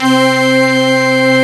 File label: Synth Organ C2; Proteus 2000; Multisamples; Digital Sound Factory Proteus 2000